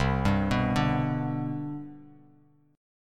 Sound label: C Major 7th Suspended 2nd, C, down, Bass Standard; CM7sus2 arpeggiated; JGuitar